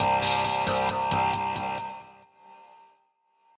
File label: Fail